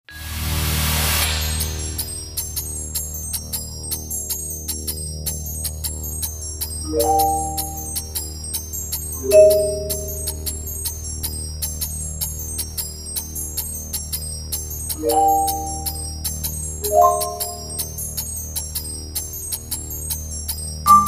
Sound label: marimba